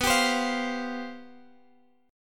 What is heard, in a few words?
C Suspended 4th B; Csus/B; strummed